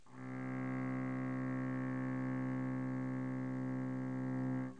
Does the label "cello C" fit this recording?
Yes